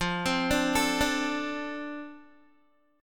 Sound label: F 6th F down Guitar; F6 arpeggiated